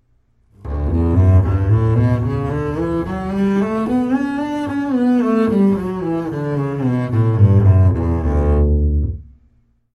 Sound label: Bass D major